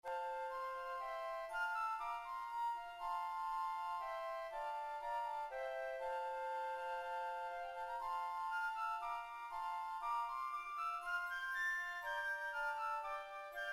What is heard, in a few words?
Hymn Flute